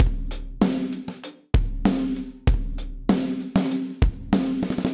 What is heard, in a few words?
Snare Roll Multirod Dry 97bpm; Fort Knox; Ableton; Factory Packs; Session Drums Studio